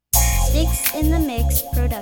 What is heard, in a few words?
mo g funk